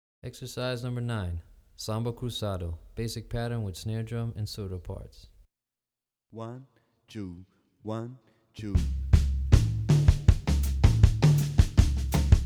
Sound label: Samba Cruzado, Drumset; Brazilian Rhythm For Drumset; DaFonseca, Weiner